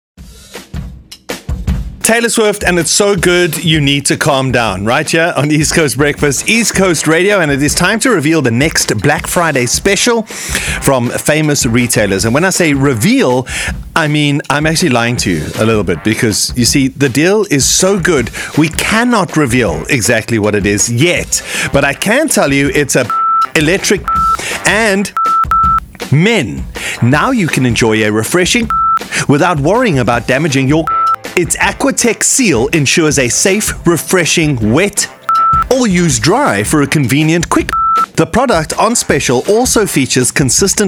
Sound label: Bleepers; retail black friday